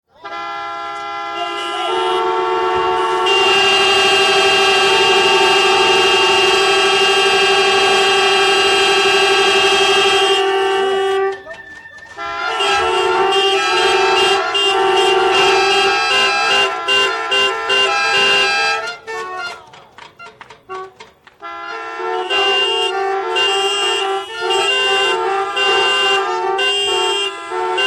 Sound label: car horns